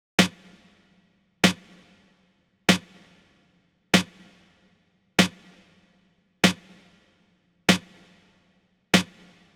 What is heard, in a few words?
Snare, 096, Cmin, Drum Tracks